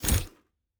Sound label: Door, Sci-Fi Sounds